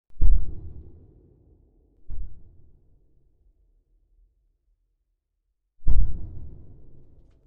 booms distant

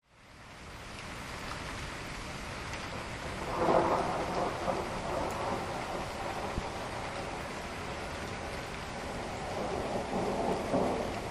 thunder rain

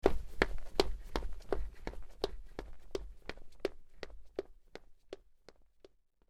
foot running outdoors

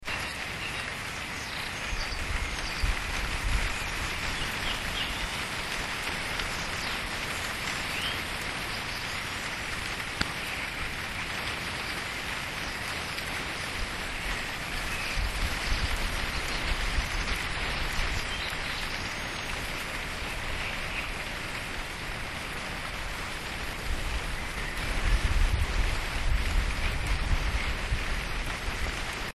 rain falling birdsong

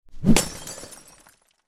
swipe and glass break

